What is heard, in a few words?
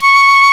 FIFE; Roland - LA Composer vol. 1; WOODWINDS